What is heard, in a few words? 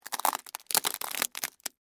Crack Crunch Slow, Texture, Crack, Crunch, Slow, Penne, Rigate; Blast Wave